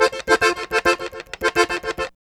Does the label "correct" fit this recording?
No